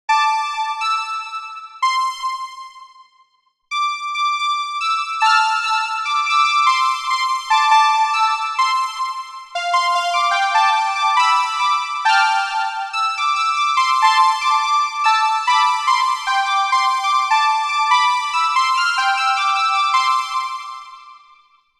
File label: Bright Synth